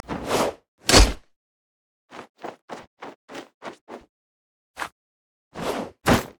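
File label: emote laugh; nautilus astronaut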